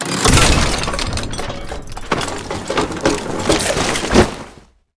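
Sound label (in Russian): сумасшедший оглушительный нескончаемый грохот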